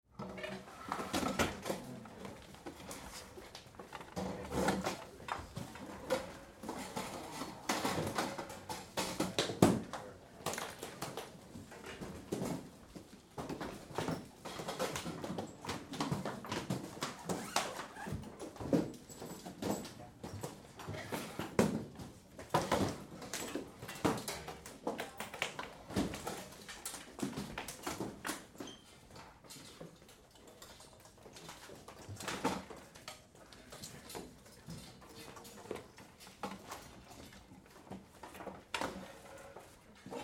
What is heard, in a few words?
Activity Music Class Unpack Instruments; Schools; Big Room Sound